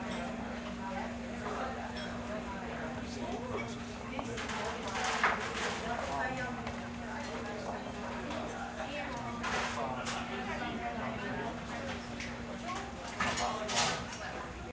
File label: restaurant; Golden Crayon Sounds